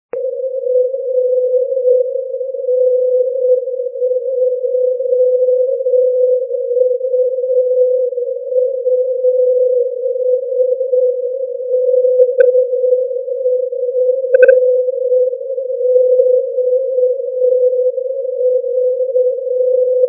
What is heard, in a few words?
cw qso